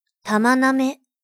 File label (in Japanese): 玉舐め